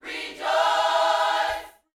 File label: Vocal, Gospel, PHRASE; Spectrasonics